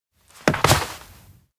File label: jump; jet; anemoi